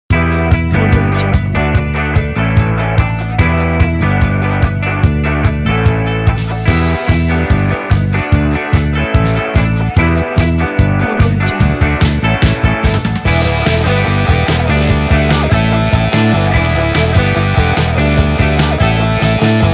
hot pop rock

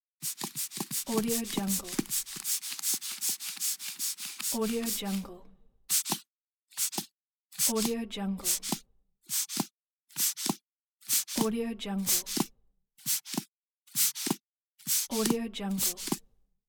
sphygmomanometer; moonaudioproduction

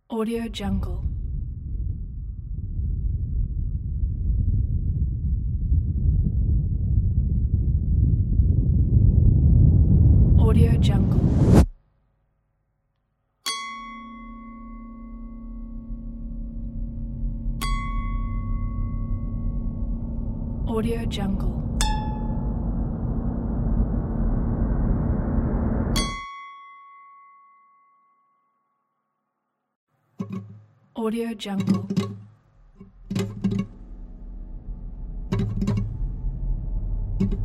art house experimental horror trailer

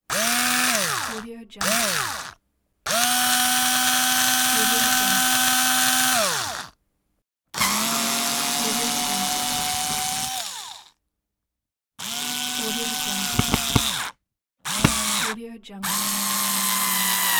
electric screwdriver

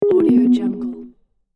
chill melodic retro video game exit menu sound

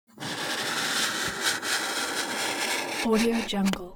object office marker mark soft long; smartsoundfx

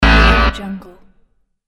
wrong choice buzzer

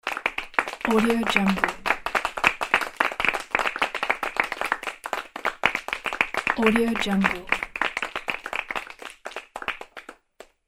small crowd clapping

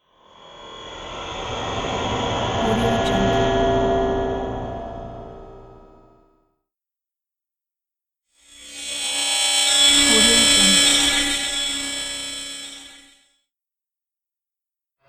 game teleport devices

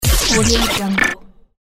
glitch

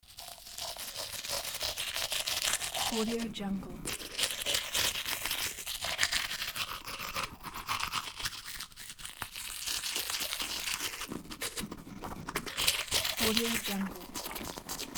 brushing teeth

